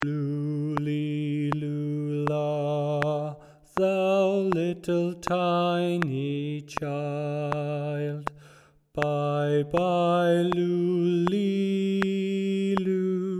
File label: Alto